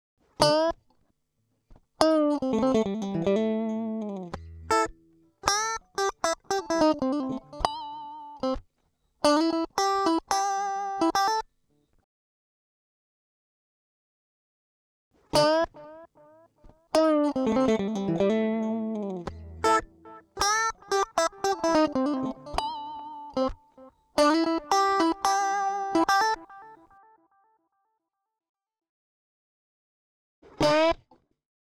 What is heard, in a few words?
guitar reamping